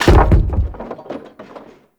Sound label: Debris Metal Wood; Ambience; Gameplay; Warframe; Entrati